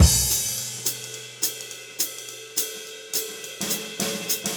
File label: NuJazz Swing Drums, Jazz; Digidesign; Pro Tools Essential Demos; Essential Loop Demos; Demo Session - Jazz